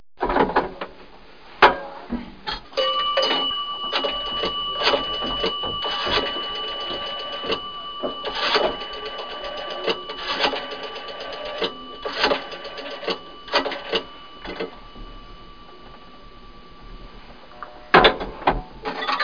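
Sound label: pay phone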